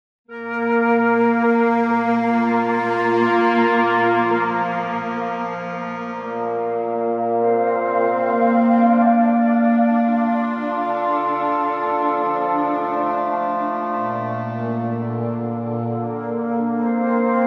KORG wavestate Träumerei Stereo Out

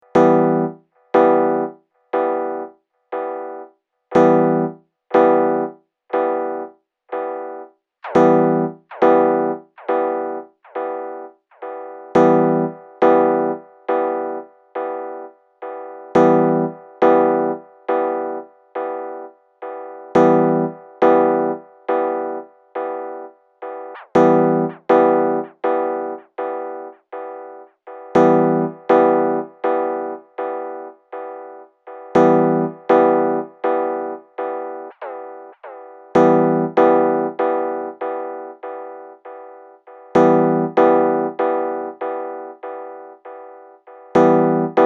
Delay Zeiten @ 120 BPM

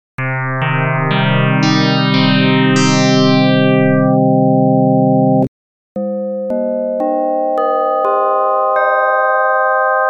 Lin und Log FM

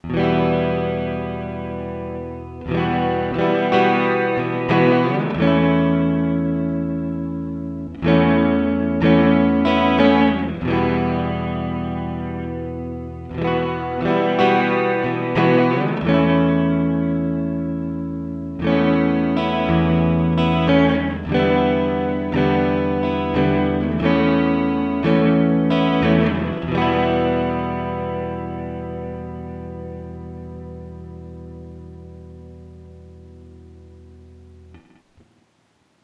Binson Echorec E Gitarre Swell short; T-REX

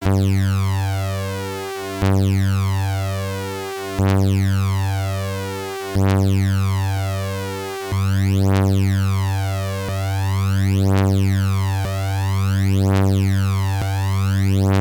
Sync Time im Uhrzeigersinn; Demon Core

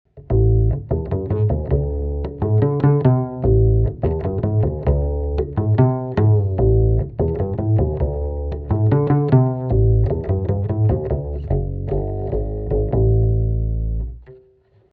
DI Kontrabass

